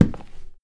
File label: Indoor Footstep